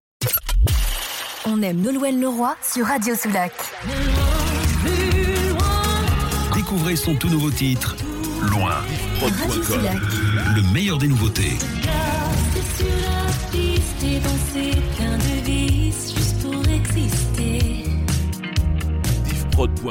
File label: Power intro; Radio Soulac